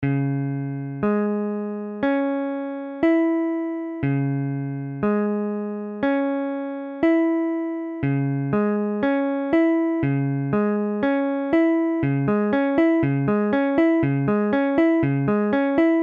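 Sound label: C sharp minor; guitare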